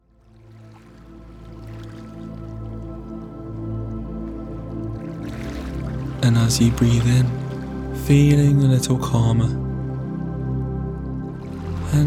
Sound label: calming sea